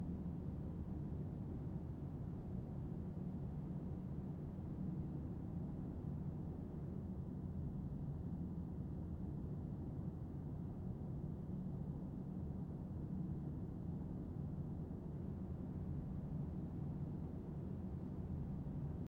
asylum hall ambience

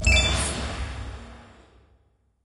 SFX Hud Open; gamerip; shuimufeifanjing

